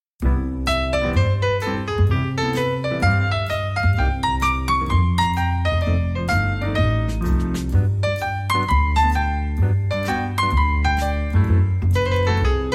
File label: coffee shop jazz, smooth jazz by night